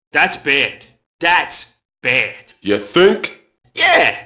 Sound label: mobster group noise; enemy; Max Payne